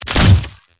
Laser Fast, Raygun; quakeball

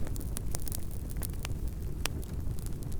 Fire Crackly

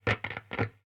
Crackle, Radio

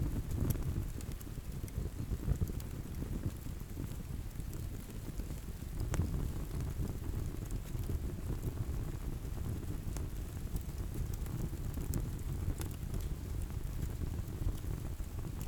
Fire End

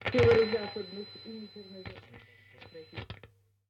Special Crackle, Radio

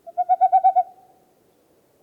Owl

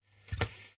Pop, Radio

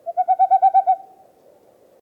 Owl

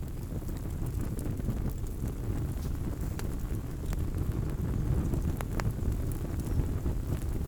Fire Intense

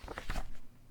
Open Flip, Paper

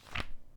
Open Flip, Paper

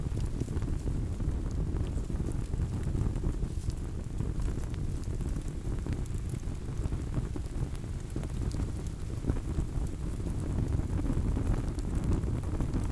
Fire Intense

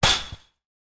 Chain Link Fence Impact; quakeball; ZapSplat

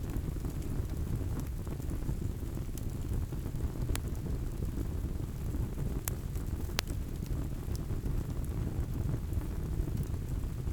Fire Intense

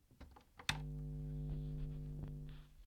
Start Hum, Radio

